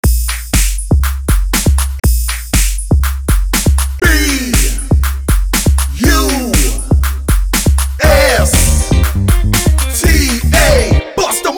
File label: rap